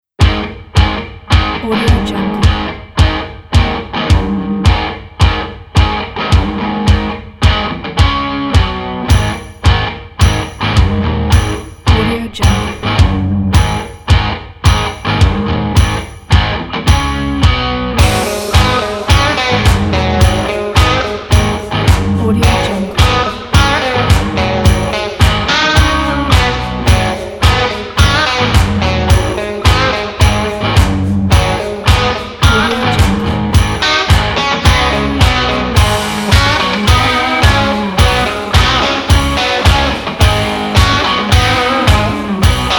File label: country